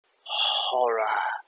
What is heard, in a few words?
horror